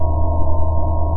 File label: railgun hum; weapons